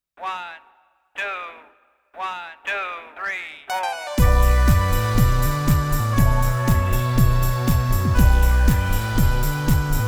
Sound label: Techno Beat